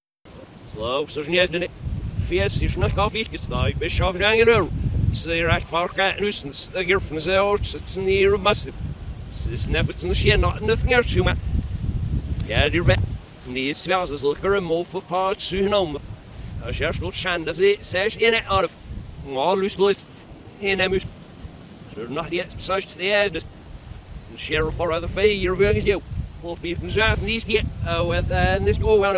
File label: cassette recording